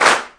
thick big clap; Claps

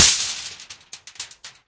Foil Hit; UNUSUAL KITS and FX; ModeAudio

